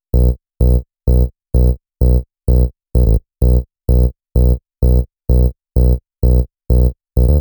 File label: 128 BPM Tonal Bass Loops B; Melbourne Bounce; ULTIMATE MELBOURNE BOUNCE VOL. 1